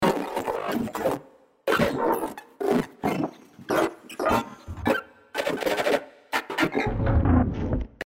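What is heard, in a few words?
percussion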